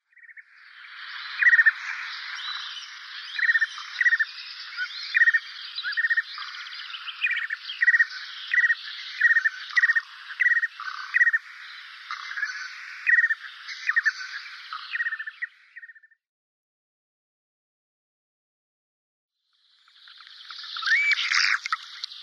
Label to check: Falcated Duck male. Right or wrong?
Right